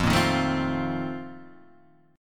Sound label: Bb Major 7th Suspended 2nd Suspended 4th